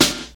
old school hip hop snare drum b key, steel snare drum sample; snare drum samples